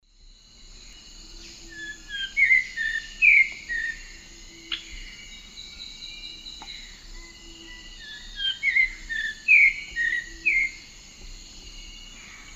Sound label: Habia rubica, song and calls; S. America; Eastern Lowlands